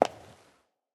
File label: footstep; Smite; Baron Samedi